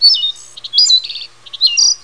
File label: singing